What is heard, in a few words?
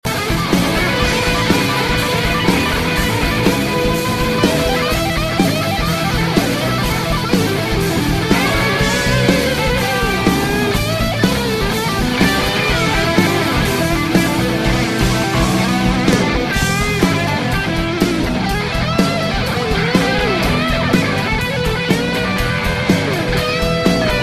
Guitar Instrumental